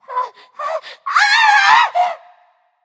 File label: fear; smite; neith